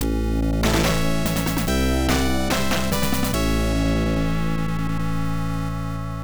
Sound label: Jingle, OPN; Gulf War Soukouden; pc 9801